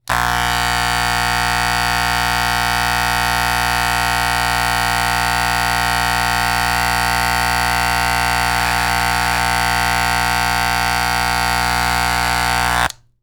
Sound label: Technology Electronic Clippers Hair Clippers Turn On And Off